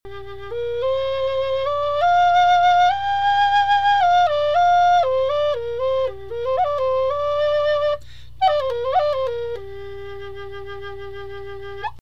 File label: g pentatonic, flutes; Take-anywhere Flute